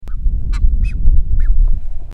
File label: Masked booby